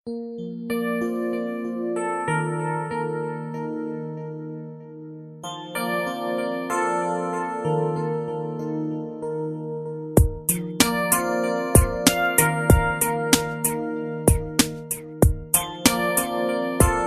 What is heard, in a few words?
Music